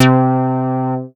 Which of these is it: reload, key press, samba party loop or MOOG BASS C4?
MOOG BASS C4